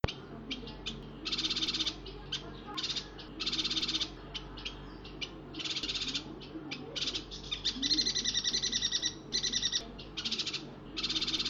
yuhina kalimantan

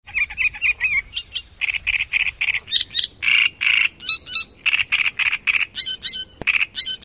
kapas tembak

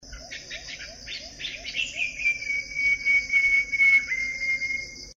suara brinji emas seram

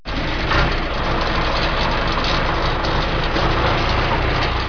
suara mobil

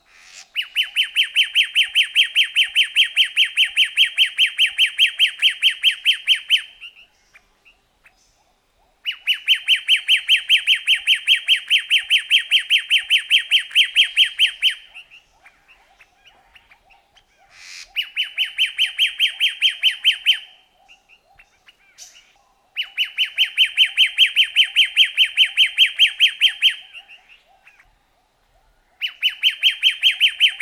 kuthya himalaya

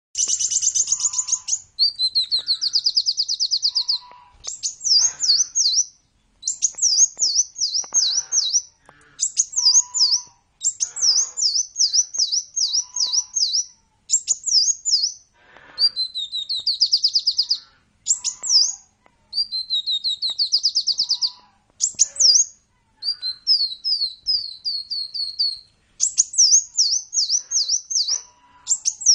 suara burung kolibri

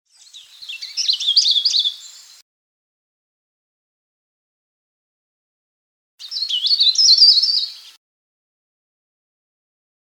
kicauan cikrak sulawesi

